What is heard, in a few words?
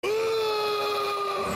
uuuuurrrrrrrrrrrrrrrrr